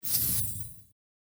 Strike Individual

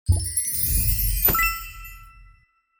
Title Animation

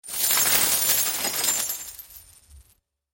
Coin Shower

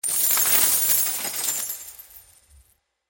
Coins Splash

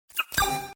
Widget Entry; DailyBonus

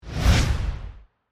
Spin Wheel Disappear; DailyBonus

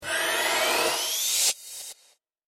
Coins Rollup

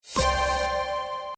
Check Animation Sound; DailyChallengesPopup

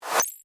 Pop Up Entry

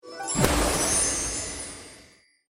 Anime Pop; DailyChallengesPopup

